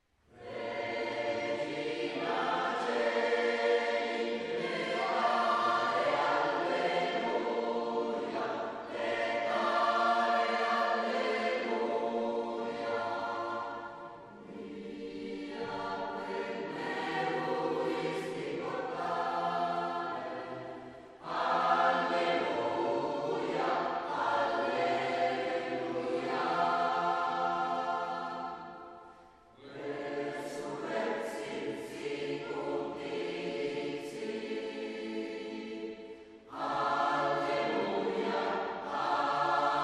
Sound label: Regina Coeli; A. Lotti